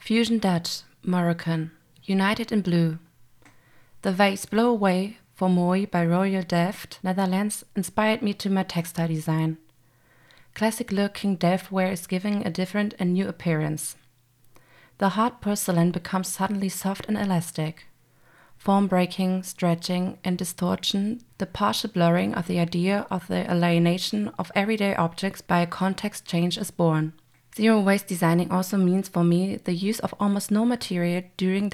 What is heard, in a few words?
Fusion Dutch Morrocan